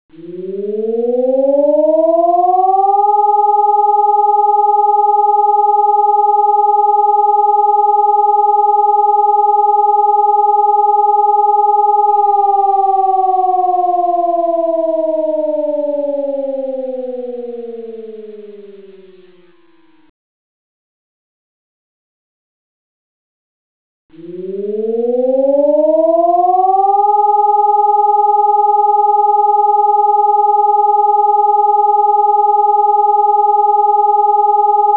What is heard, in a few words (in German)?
alarm feueralarm